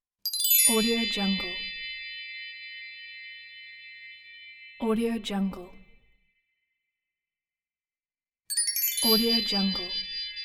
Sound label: magic wand